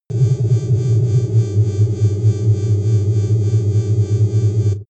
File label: space alien machinery